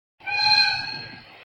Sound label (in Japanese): kiteki